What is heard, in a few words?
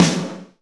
Ensoniq SQ-1 Rock Snare